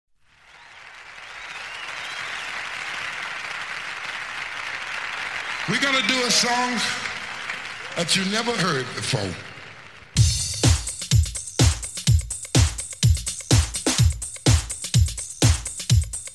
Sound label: The Piano Mix